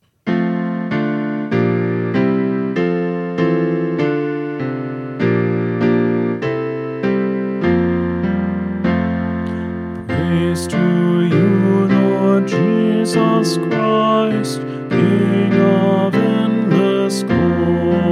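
Gospel Acclamation All Parts, Choir Practice Tracks; Lenten Gospel Acclamation; Holy Thursday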